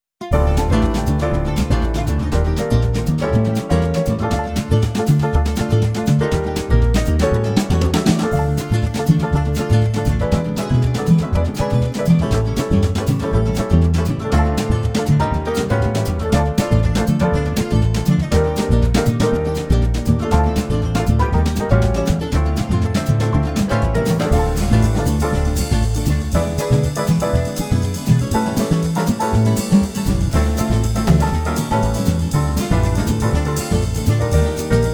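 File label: alt style winds, jazz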